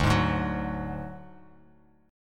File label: D Major Flat 5th D Bass Standard, chord